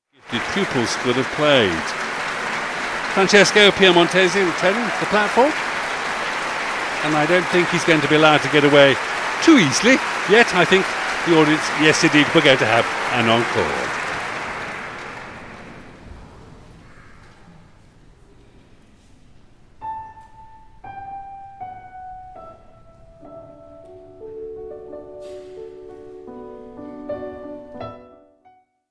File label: piano sonata k284; Wolfgang Amadeus Mozart